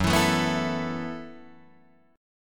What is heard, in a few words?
F sharp Minor 11th, Guitar, Drop D